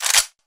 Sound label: rifle slide forward, rifle ak47; weapons